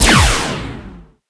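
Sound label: distant shot laser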